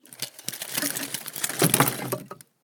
scrap wood falling interior perspective debris rubble; eneas mentzel